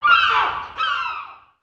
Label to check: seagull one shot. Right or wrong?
Right